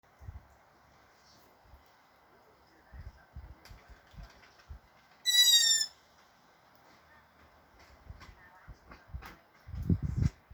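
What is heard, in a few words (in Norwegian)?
spurvehauk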